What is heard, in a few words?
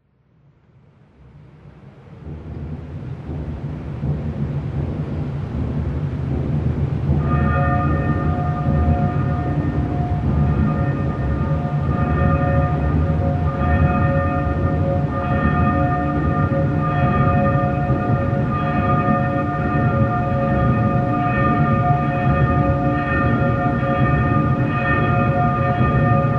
Bells from the Basilica Instrumental